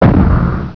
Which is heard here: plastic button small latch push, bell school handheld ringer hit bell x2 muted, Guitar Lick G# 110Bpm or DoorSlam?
DoorSlam